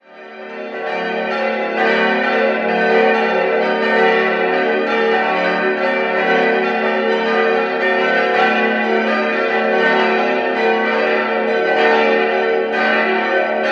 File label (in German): Moehrendorf ev, Evang. Laurentiuskirche, Glocken; Idealsextett fis'-a'-h'-d''-e''-fis''; 1972; in Passau gegossen; Rudolf Perner